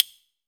CASTA AMB, CASTANETS; AKAI S-Series CD-ROM Sound Library VOL-5

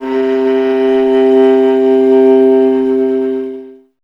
viola C; Miroslav Vitous; Solo Instruments VOL-1

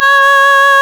REED C#; Big Fish Audio; Synclav FM

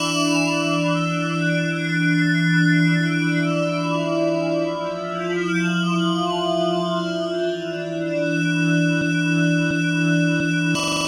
Keys, Drum n Bass; KJ Sawka; Drum n Bass & Beyond

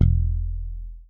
SA4MUTE1, Bass; Sampleheads; Will Lee Bass Library

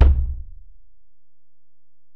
Ac BD, Alesis DM-PRO; 300 Drum Machines

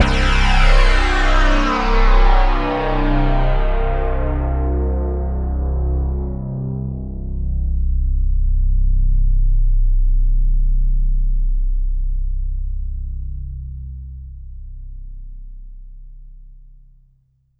steel bass hits C1; Q Up Arts; Denny Jaeger Private Collection

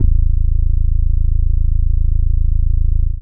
Sub, drum

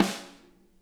SNARE, Interactive Drum Kits; Sonic Reality

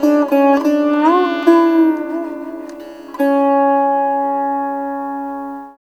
SITAR; Spectrasonics; Heart of Asia

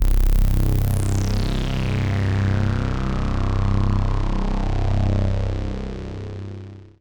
PAD, DIGI-PAD

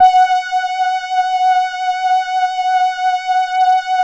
LAMBDA, String Machines

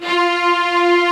ARCO STRGS M; OMI - Universe of Sounds

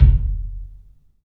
KICK, 3-D Percussives; E-MU Producer Series Vol. 5 – 3-D Audio Collection; P.A. Kit

